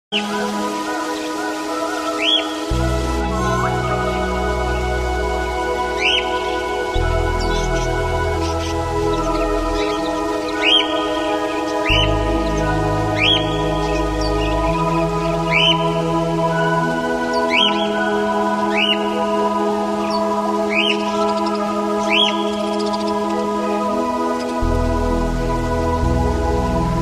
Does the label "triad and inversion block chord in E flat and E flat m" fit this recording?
No